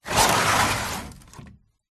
industrial large heavy 10l tank plastic full water slide hard ground; zapsplat